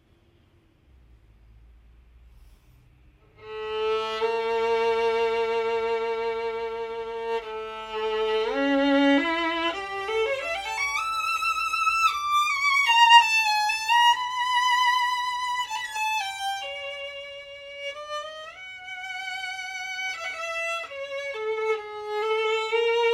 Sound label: Master, Professional Violin